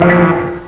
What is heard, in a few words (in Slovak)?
Lodna sirena; Vesmir; Revelation Sight and Sound SK